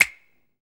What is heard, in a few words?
DRM OLD, dance synth